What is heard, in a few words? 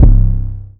808 Mini - C; trap; 808s; Cymatics; Trap Starter Pack